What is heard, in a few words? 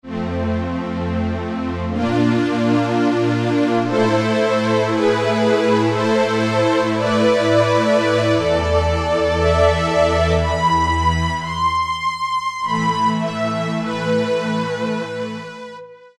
ST SynStrg, Yamaha TG77; Factory Presets